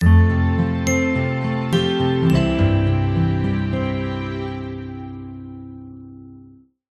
multimedia signature ident warm sincere piano strings glock guitar picked bass; zapsplat